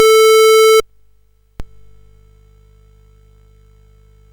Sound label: Dragonworld sid CSG8580R5